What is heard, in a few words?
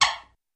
Single Car Horn Honk; Various Single Car Horn Honks, Beeps, Chirps, Etc..